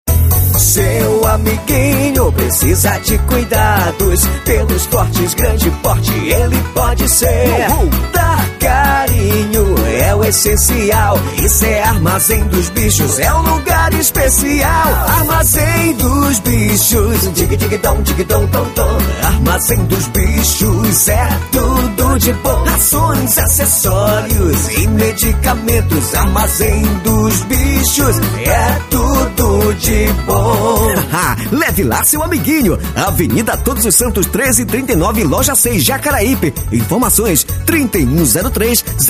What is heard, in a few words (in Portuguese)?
jingle pet, Jingle comercial